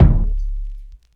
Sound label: crackmoney kick